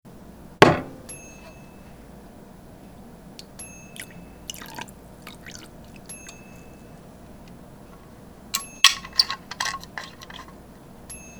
Pouring a Shot of Liquor

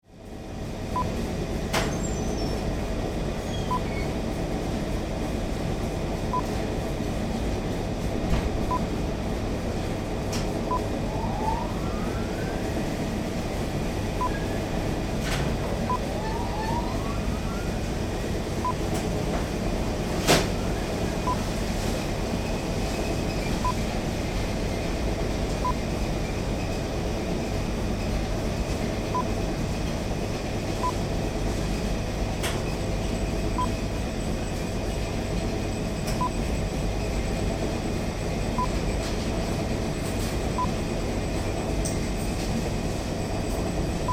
Launderette Ambience

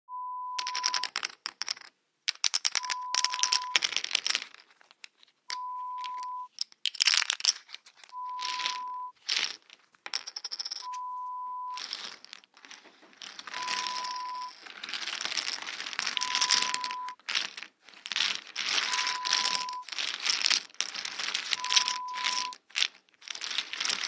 Casino Chips

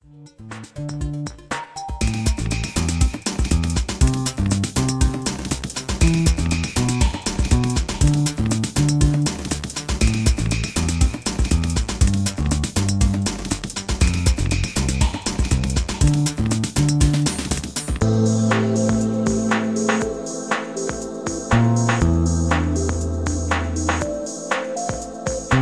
Electro Ambient

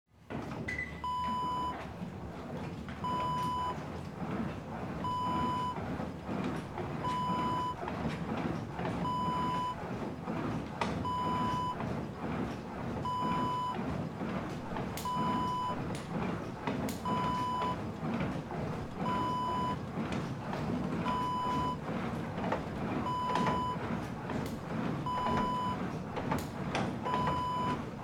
stairmaster

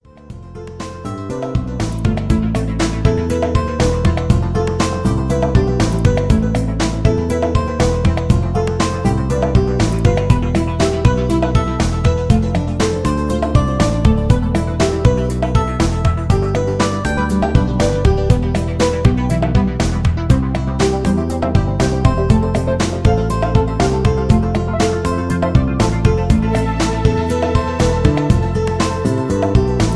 Ambiental Soft Rock

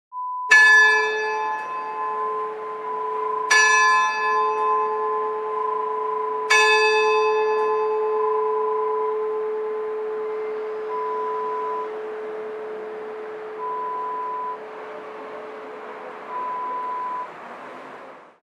Church Bell sound

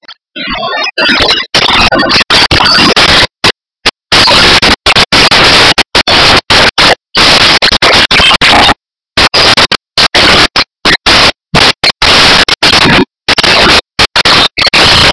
Salon or Barbershop ambience

